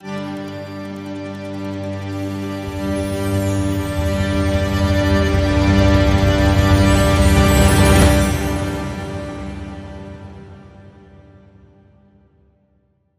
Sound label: Celtic Rise